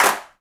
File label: clap mp, drum kit; unpitched percussion